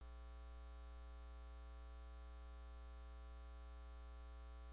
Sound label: moan